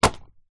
sport swimming cap latex drop carpet; zapsplat

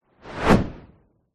whoosh fast; sound design

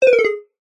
game sound synth mallet style short negative

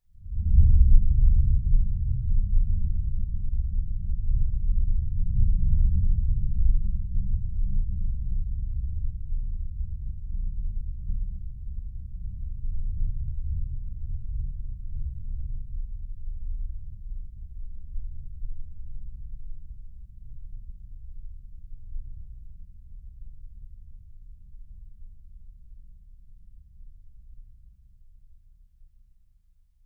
nature thunder rumble just low frequencies

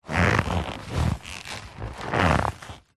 foley leather baseball glove movement creak

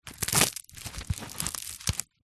foley hat sombrero handling

